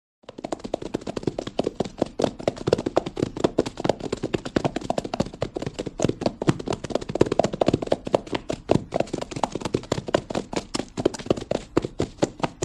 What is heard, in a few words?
By Trotting Horse